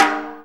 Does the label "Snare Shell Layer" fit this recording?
Yes